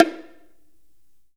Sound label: BONGO HI TON; Big Fish Audio; Ross Garfield Drums VOL-2; GM PERCUSSN